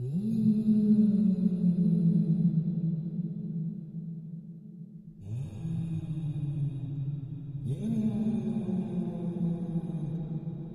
fantome

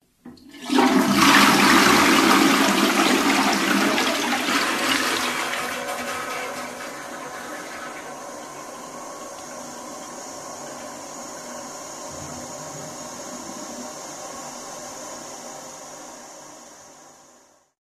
chasse d'eau

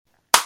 claque de main

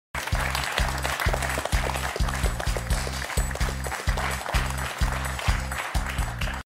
applaudisement

